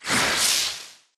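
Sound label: impact bullet energy titan shield arc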